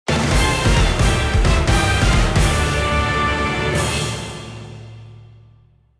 Victory; Atelier

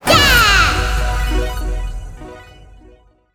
sfx mission accomplished

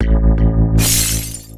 Character Hit

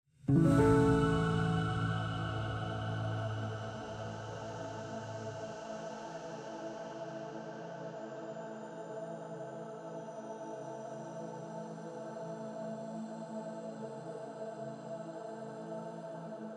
Recording Buttons Stinger; Forest Music

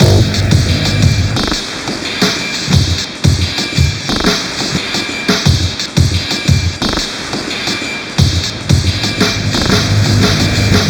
Midtempo176 Transition; Furi Avenger

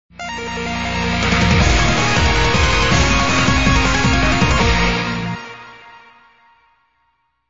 Level completed; Bitejacker

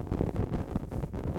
Medium Loop Fire

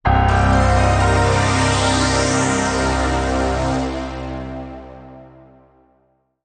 Jingle Battle Clear; Bullet Girls Phantasia; PS Vita

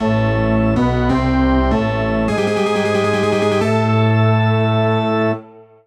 Jingle Boss Intro; Mario vs. Donkey Kong

